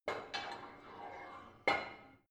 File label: barbell heavy plate put on soft, fitnessstudio; sport freizeit